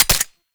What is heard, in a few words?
Pistol Mech; Warframe; Tenno; Lasrian